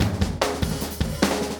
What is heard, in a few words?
Drums, Rock